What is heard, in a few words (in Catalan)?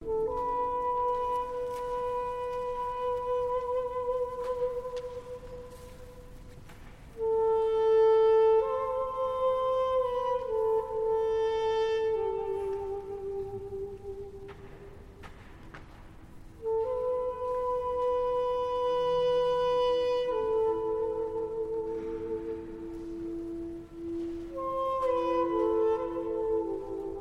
Improvisació duduk; Llibre Vermell de Montserrat